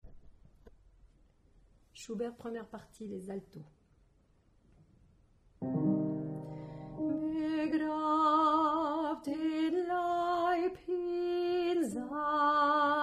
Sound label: Alto; schubert